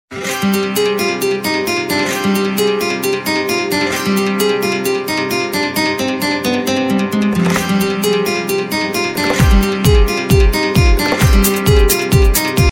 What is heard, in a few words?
Amazing Guitar